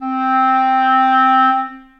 Eb Clarinet Solo Legato Vibrato, Orchestral Woodwinds; Ableton